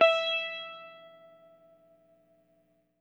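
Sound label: electric sitar hard; Quantum Leap - Guitar & Bass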